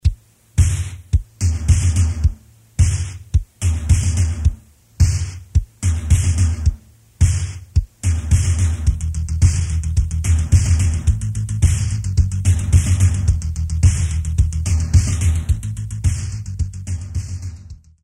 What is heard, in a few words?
drums